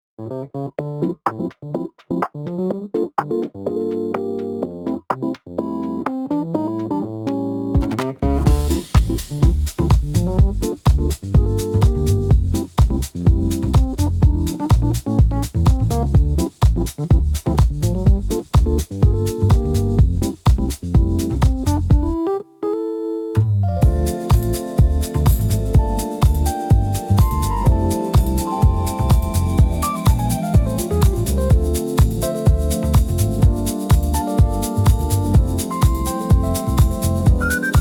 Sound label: Corporate music jazz